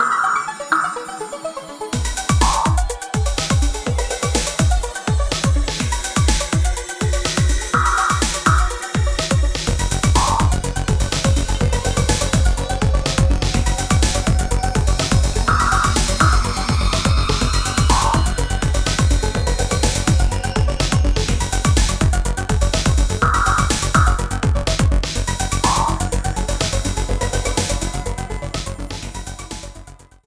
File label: Brass